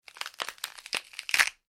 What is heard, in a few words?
horror bone crunch; Bone Crunch / Crush - Crushing And Crunching Of Bone; Breaking; Objects; McKinney Sound